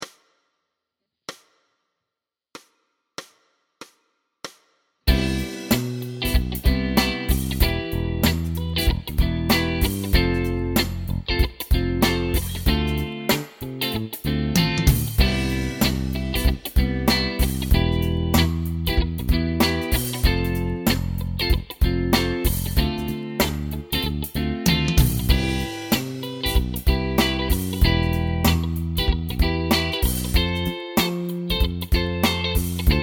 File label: chord